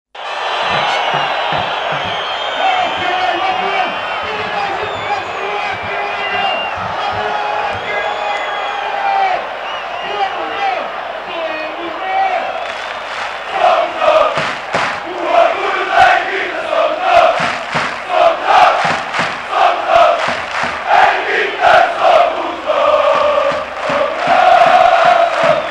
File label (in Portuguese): a Invicta Somos Nos, Chants